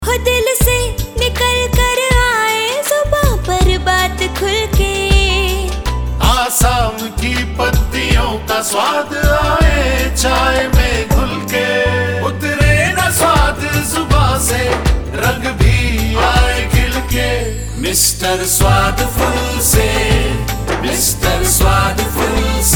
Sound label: Jingle